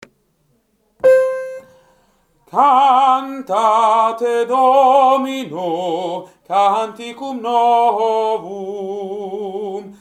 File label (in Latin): Cantate Domino Soprani